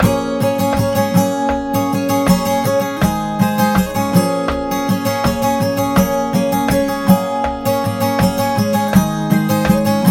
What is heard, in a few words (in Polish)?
bicie refren cwiercnuty, gitara